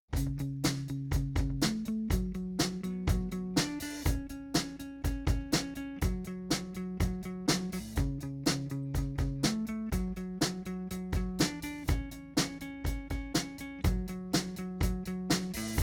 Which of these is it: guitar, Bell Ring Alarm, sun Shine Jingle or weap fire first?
guitar